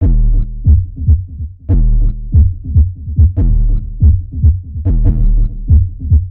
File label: 142bpm, Sound Loops; HeartAttack; CDP Sound Loops